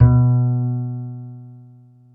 PX ACOUS.B 2, Bass; Masterbits CLIMAX 7; PX BASSES

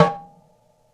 Rocket Tom, Tom One-Shots; KJ Sawka - Drum n Bass & Beyond; Drum Tool Box

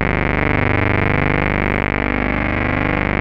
2600 A1, ARP 2600; Hollywood Edge - Giorgio Moroder Rare Synthesizer Collection

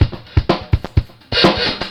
fx 125, Beat Loops; Carnage; Zero-G - Monster Beats

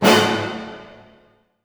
D# DIM FF, ORCH HITS, Orchestra; Sound On Sound; Peter Siedleczek's Orchestra

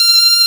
SONA F5

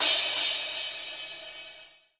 Crash; Equipped Music; Loungin House

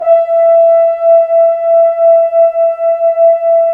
BRS F HRN MF, FRENCH HORNS; Roland - LA Composer vol. 1

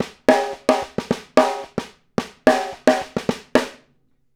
Snare Pattern, Drums; Essential Percussion

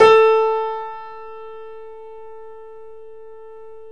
KEY, Steinway ff

